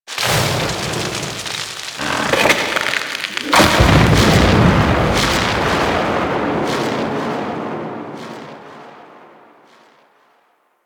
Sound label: Forest Distant Tree Fall; Titania Quest